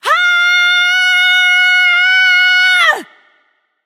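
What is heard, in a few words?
nonverbal battlecry; smite; voicepack; izanami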